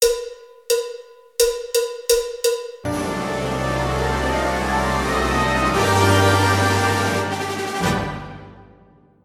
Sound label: Spanish Dance